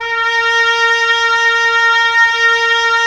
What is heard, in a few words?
OB, Oboe; Roland - LA Composer vol. 4